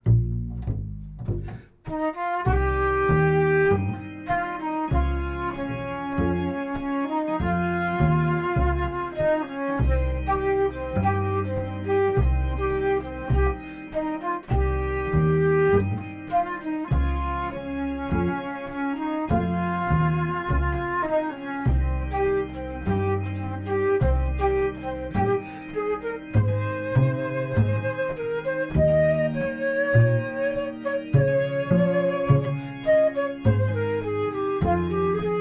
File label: flute and bass and playback organ